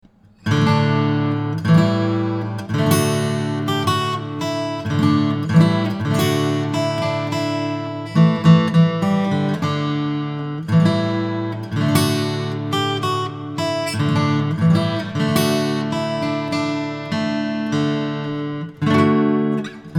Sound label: Trumpet Voluntary